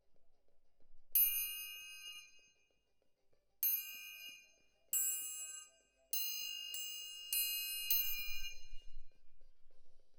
Perc Close

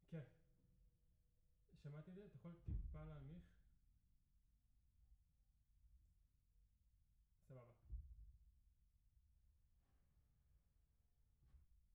Piano Mono

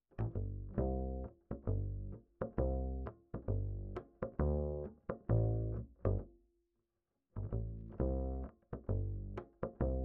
Bass Line

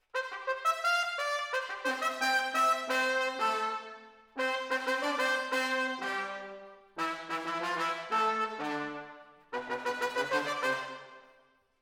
Horns Over

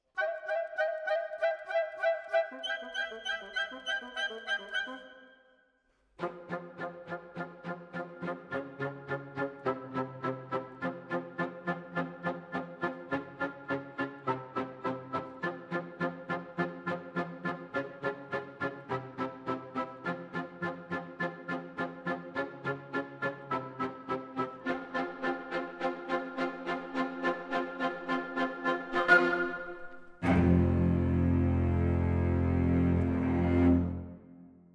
Orchetra

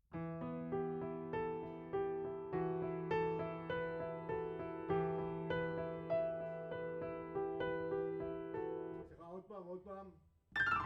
Piano A

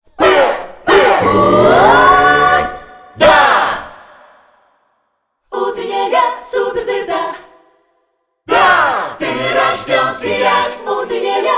ALL CHOIRS

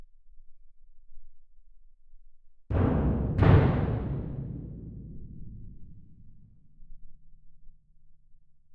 Twin R Perc

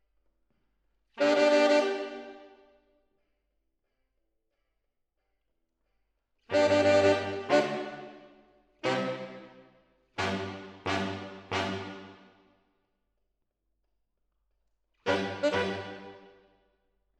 MKH AB, piano

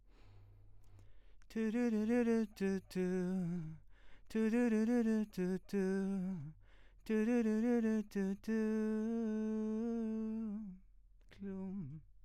VOX

